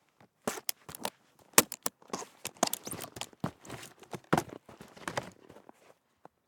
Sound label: Snowboard Unstrap Bindings